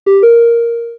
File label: growl humanoid; mmo spiel